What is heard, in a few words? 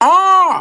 aw; ooh ahh